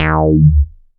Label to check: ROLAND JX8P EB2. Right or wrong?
Right